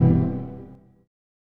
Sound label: HOUSE FX 125